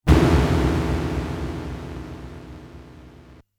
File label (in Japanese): ショック FM音源版; fray msx remastered soundtracks 2008